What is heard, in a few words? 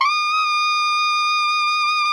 TENOR GROWL D#6, Saxophones; Greytsounds; Philip Wolfe David Crozier's Saxophones